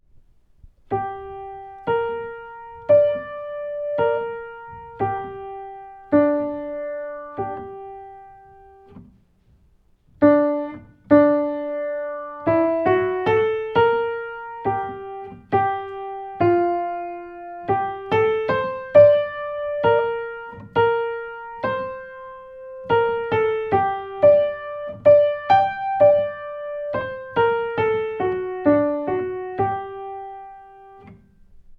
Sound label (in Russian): Мелодический диктант; 3 класс сольф